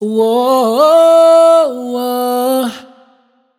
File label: riff whoa 105 Am wet, Vocal; Slate Digital Omnivox Vocal Sample Pack